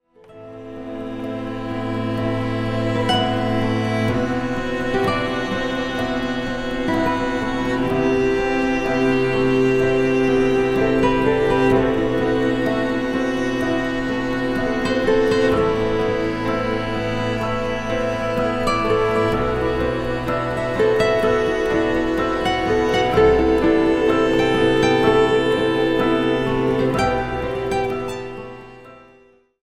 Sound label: CELLO piano